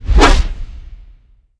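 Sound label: Attack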